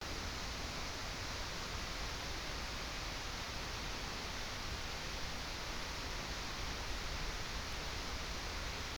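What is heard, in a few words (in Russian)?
шум водных потоков на расстоянии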